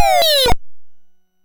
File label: MOS6581R2, sid; Future Knight